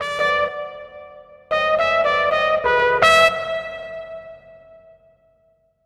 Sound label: Trumpet